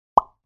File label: Pop up text notification